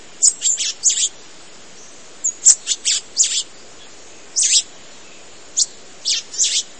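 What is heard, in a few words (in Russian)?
танагра напевает и повторяет одну мелодию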